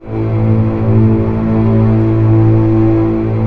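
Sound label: strings A0 PP; Sound On Sound - Peter Siedleczek's Orchestra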